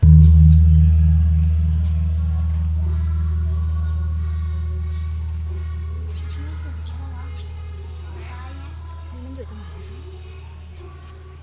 Bell Temple Sukhothai

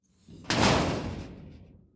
Med Metal Hit

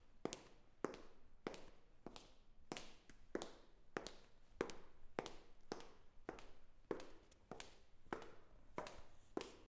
Walking

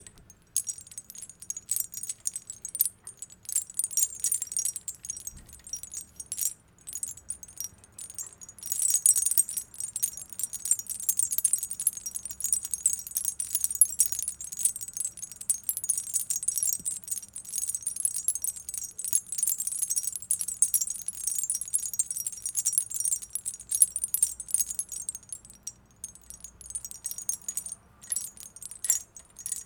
Chimes Sparkle

